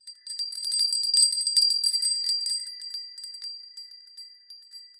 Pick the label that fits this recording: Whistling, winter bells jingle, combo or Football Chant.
winter bells jingle